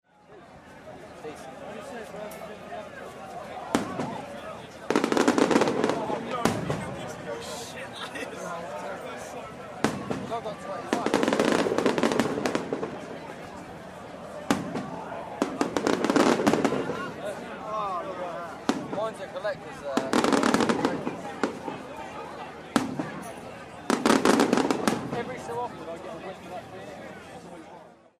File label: fireworks; ipswich maritime festival 2012